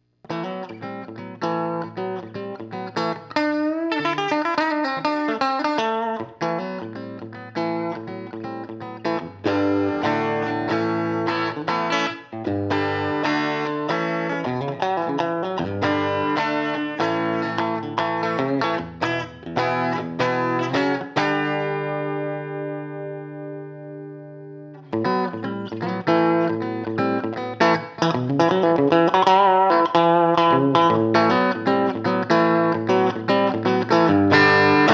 Clean, Clean w boost; Clean Tele norm Channel - boost channel; into an Apogee converter directly into Nuendo. No EQ was added.; McMusicSound, GEMTONE AMPLIFIERS and MVAmps Custom Shop

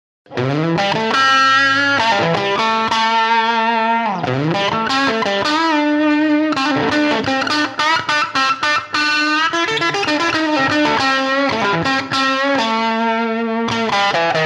Solo Tele, solo with comp delay; Solo Tele on 9 w mild comp and slight delay; McMusicSound, GEMTONE AMPLIFIERS and MVAmps Custom Shop

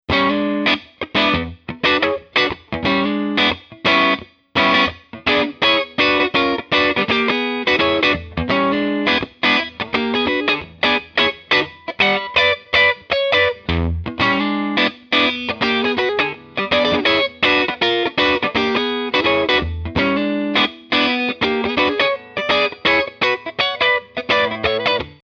Funky Strat; single channel Tweed Bandmaster design; MVAmps MasterTone; 28 Watts

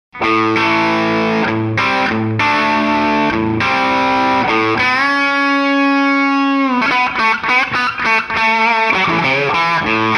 Power LP; Les Paul Lead

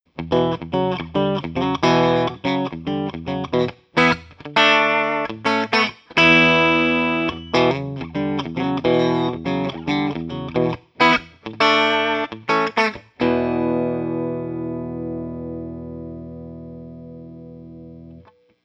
Tele 3 position; single channel Tweed Bandmaster design; MVAmps MasterTone; 28 Watts

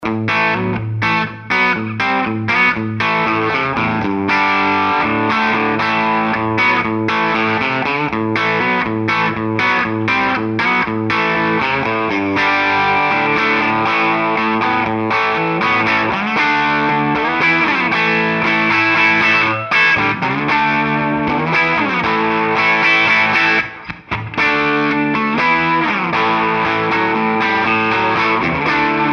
LP Med Rhythm; Les Paul Mild Rhythm Crunch

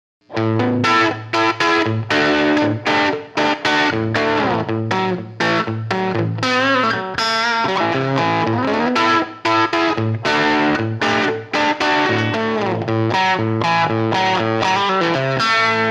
Power Tele Boost; Boost Tele Volume at 8; McMusicSound, GEMTONE AMPLIFIERS and MVAmps Custom Shop

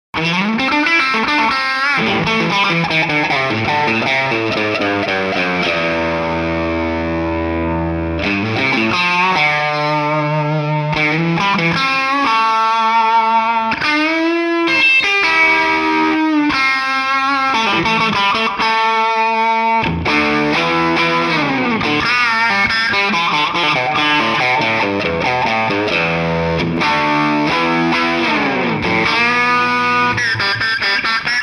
Tele Lead w slight delay

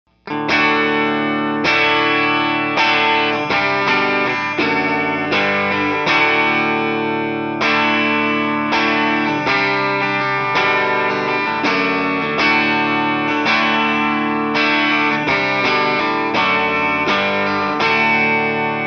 Clean Tele; Tele Clean Rhythm

